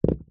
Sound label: household hairbrush set down carpet